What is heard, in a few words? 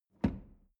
drawer medium close lock medium